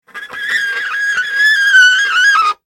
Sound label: fingernails